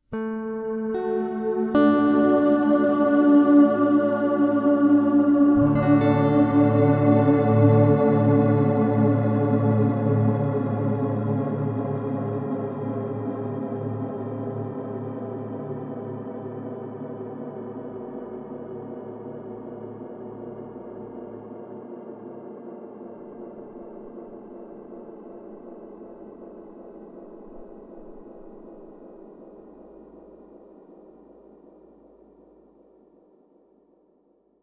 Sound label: free fall